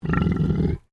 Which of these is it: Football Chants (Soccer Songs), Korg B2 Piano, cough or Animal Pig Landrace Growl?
Animal Pig Landrace Growl